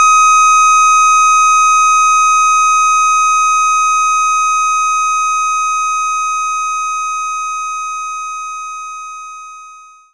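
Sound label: Jazz Guitar Loop; knights try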